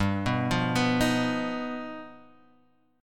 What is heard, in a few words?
G 7th Flat 13th; arpeggiated; JGuitar